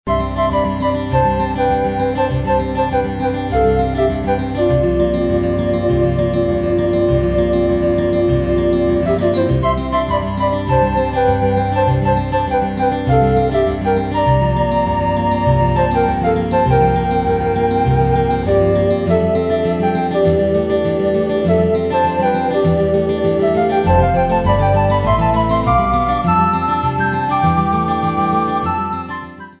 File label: atmosphere country fresh